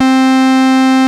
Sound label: Analogue hits and octaves